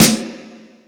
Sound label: Stadium Rox, Drum Machines